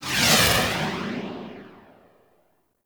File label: Iron Eruption Active Move; Warframe